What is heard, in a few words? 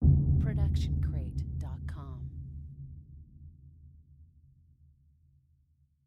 designer sub boom hit; SoundsCrate